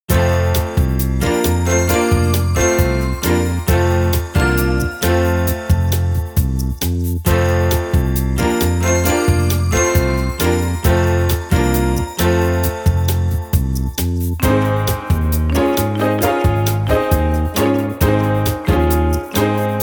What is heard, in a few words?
Conversation Screen; bullet girls 1 2 soundtrack